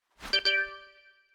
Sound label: victory new stamp; Replay VHS Is Not Dead